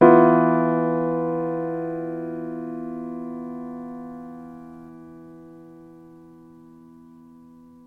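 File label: piano chord anxious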